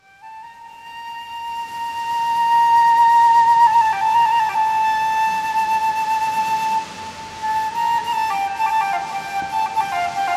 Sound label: Flute in cave; iona